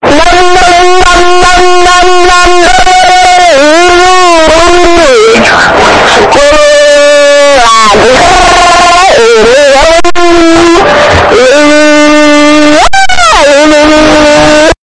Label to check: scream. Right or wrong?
Right